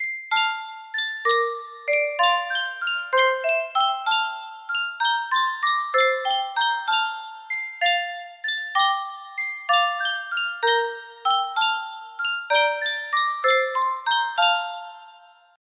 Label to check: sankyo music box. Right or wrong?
Right